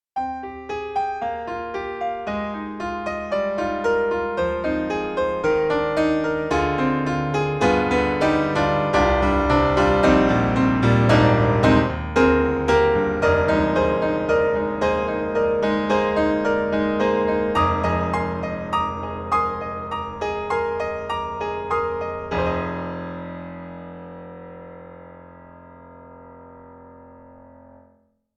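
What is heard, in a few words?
Bosendorfer 290 Hollywood Scoring Stage, piano; Steinberg The Grand 3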